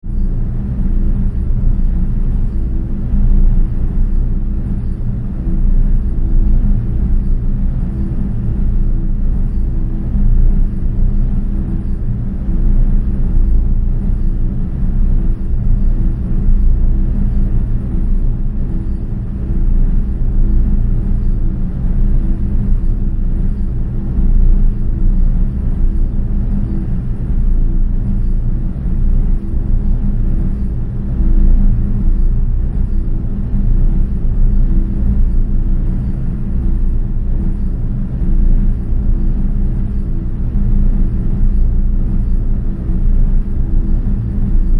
Drone, Factory, Spooky, Horror; 5.1

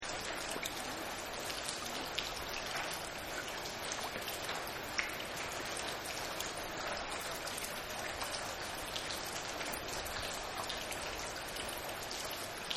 Rain Drippy City; Partners In Rhyme

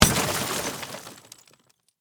Impact Automobile Window, Shatter; Crash Sound Effects; Crashes; Objects

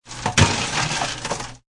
Ice Machine Dig, Digging in an ice machine; Bars

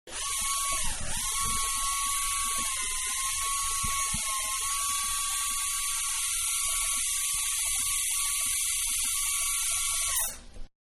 Polishing Tool, Dentist; Machinery; Machines; Dentist-Polishing Tool; Manhattan Production Music

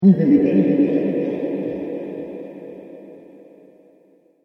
Laughing Ghost Echo, horror sound effect; Ghost Sound Effects; Andrew Fleming

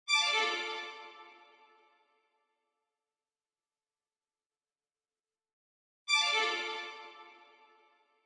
violins riff, 160 bpm; Violin Riff Loop Dm 160 bpm

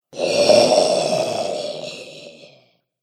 Zombie - Inmate Number 1; Zomby Sound Effects; horror

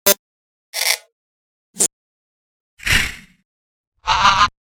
5 Sounds Production Elements, Electronic

